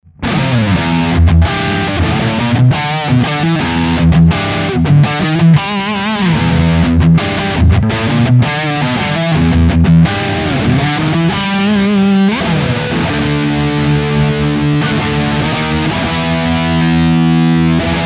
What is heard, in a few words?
Les Paul Highgain Rhythm Neck Pickup; Slash Signature